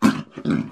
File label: cub grunt; minecraft; technic